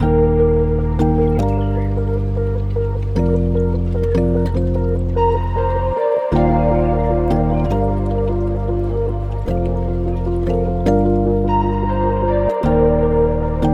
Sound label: 152 cute loop future bass granular loop A-maj; somebody